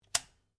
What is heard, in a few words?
RIFLE REMMINGTON700 7.62MM SAFETY OFF; A selection of domesttic firearms; Rifles; Guns